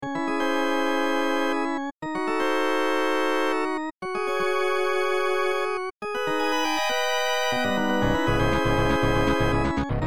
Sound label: organ